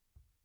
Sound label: BD Out